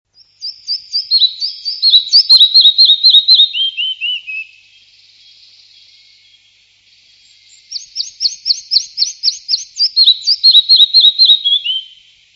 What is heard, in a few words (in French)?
gobemouche nain, oiseaux chant